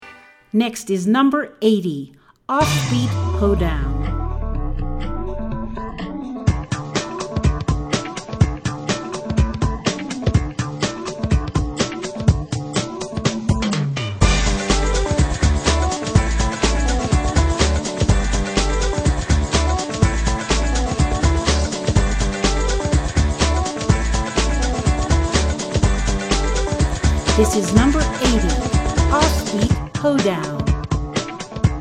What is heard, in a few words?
Offbeat Hoedown